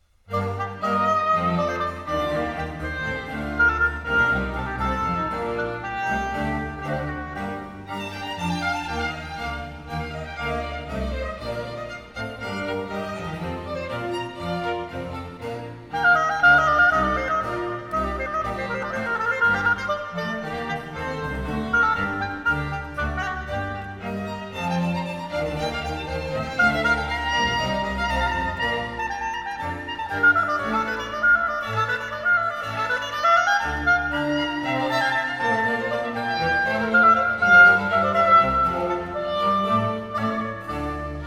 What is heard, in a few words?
concerto i g minor hwv 287 allegro; g moll